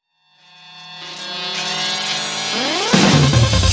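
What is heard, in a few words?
background music; trex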